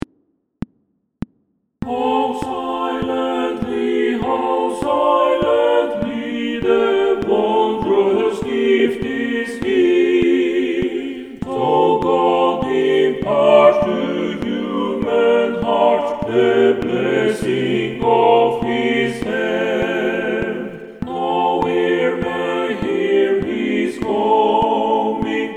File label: TUTTI PIANO click